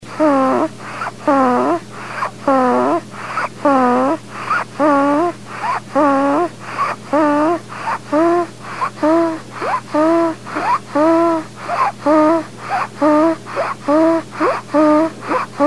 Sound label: breathe